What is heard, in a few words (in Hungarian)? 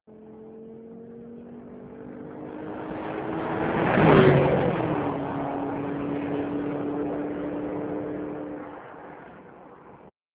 elhaladás, porsche cayenne